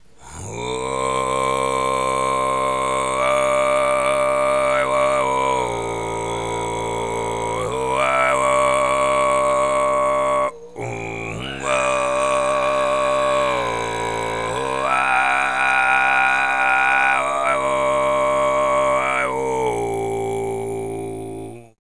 TV FREE, Vocal World Voices TUVAN LOW; ILIO; Vocal Planet VOL-5

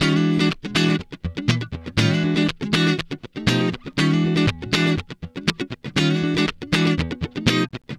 Guitar Licks

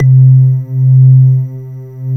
VELIMBA; MORPHEUS

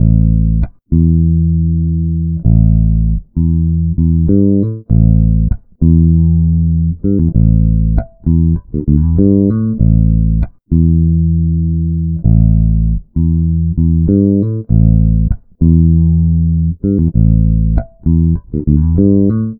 Bass, Electro Lounge; Sonic Foundry (Sony Creative Software); 098 Savvy

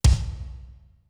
SOLID KICK, DRUMS; Greytsounds VOL-1; T1 DRUMS

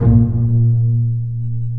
Bass Piz D Bb1; Digital Sound Factory Virtuoso

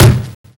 kick, Drum and Bass

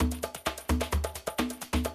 130, Classic DrumLoops

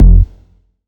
Bass F, Bass Synth

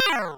synth, amiga med

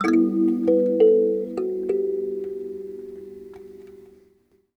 Kalimba Walk; Sonic Foundry (Sony Creative Software) - Friendly Fire

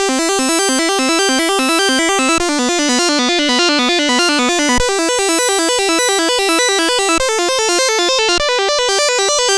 C64 Chord, 8-Bit chiptune

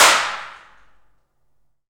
PRC ALMWA, Aluminum Wall; Roland L-CD701; FX Perc 1

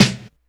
snare, Hardcore Breakbeat; Big Fish Audio; Hardcore Breakbeat Action; Robot Live

